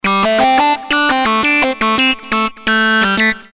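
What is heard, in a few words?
bass Fuzzline pitch mod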